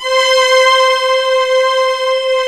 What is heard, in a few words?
LUSH STR C5 L, KORG, Analog; USB Soundscan vol.49; Analog Fantasies; AKAI